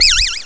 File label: ufo launch; cstrike; next21 kart